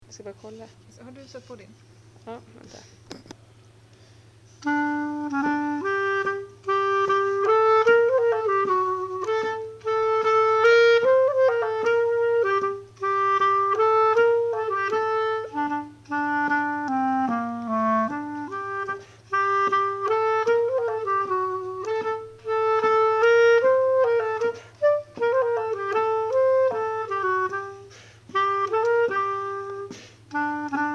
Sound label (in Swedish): Brudmarsch från Jämtland